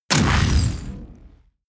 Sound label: sci-fi crushing burst